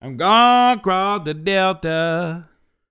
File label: DELTA, BLUES; Blues & Soul; ILIO; Vocal Planet VOL-2